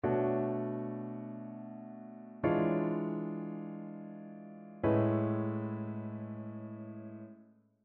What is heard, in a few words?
ii V I progressions; ear training